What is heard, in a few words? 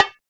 HRD BGO OP HI, BONGOS; Drums In Your FACE!